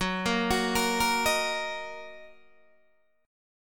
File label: Fsharp 6th down Guitar Open D